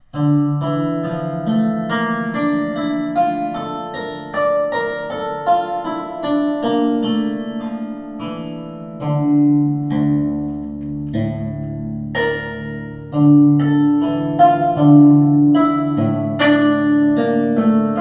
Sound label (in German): Pentatonik Hemitonische Beispiel; Martin Kucera